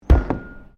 METALIC MUFFLED CREAK; Metal Sound Effects; Metal (Sound Effects:Materials)

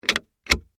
Metal Latch Move, Foley, Metal, Latch, Movement; Latches; Objects; Blastwave

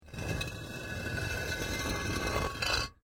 Brick Medium Hollow Move; Material components for constructing more complex sounds

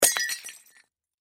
Crash Glass Vase Drop Break; Breaking; Sound Effects:Objects; Blastwave

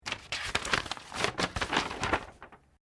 PAPER SHEET MOVEMENT UNFOLD

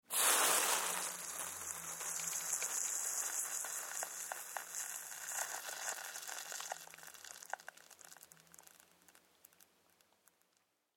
Sizzle Iron Burning Water Fizz; Hisses; Objects; Big Room Sound

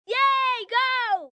Cheer Yay Go, 1 child, 8 yrs, Yelling; VoiceBox

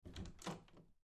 Bedroom Door Open; Household; Blastwave

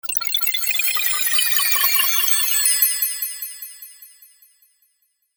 Fantasy Spell, magic sound effect

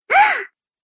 Gasp Cartoon Game Voice

